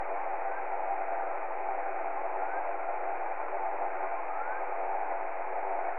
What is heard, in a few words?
Ship modem; Challenger Deep; acoustics; ShipGlorius